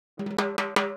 Timbali, Latin Percussion

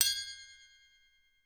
TRASHY METAL, Definitive Percussion

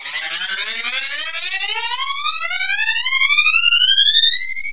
RISING, Anime Sound FX; COMIC

